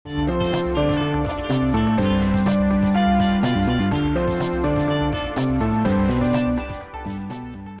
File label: loop afternoon watch